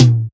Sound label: Tom SP; Drums